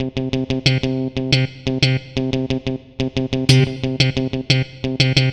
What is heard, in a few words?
Funky Guitar, Guitar Synths; Dr. Fink's Funk Factory